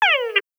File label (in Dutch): Lokroep Boskikker